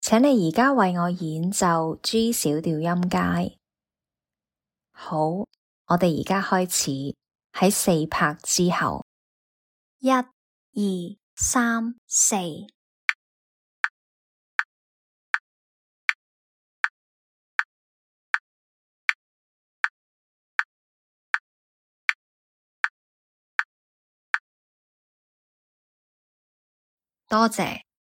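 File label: G minor scale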